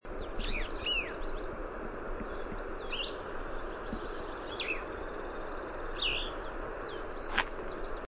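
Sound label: Plumbeous Vireo song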